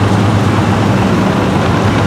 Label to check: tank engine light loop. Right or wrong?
Right